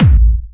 Harder, kicks

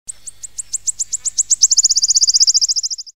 Wood alarm; Nokia Ringtones; Audio themes

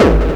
bombo, kicks, Hardcore

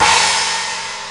China, Roland S50 Cymbals; Drum Samples

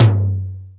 Tom M, Roland R8, Toms; Drum Samples

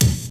Tekno k, Drum Samples, Roland JD-990

